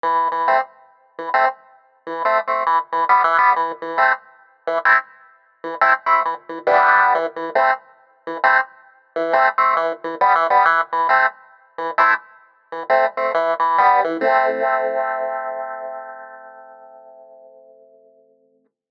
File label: Kontakt7 Clavinet Wah